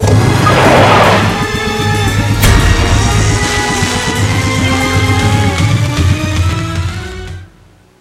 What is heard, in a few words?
Turbo Boost Start; knight rider